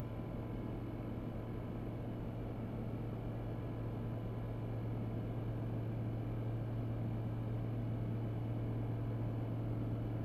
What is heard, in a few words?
fan